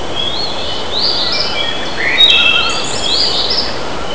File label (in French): foret